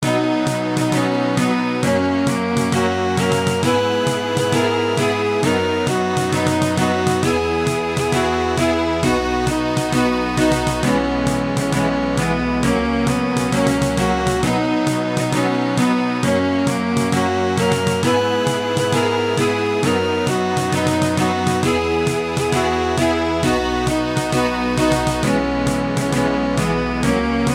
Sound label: Game Over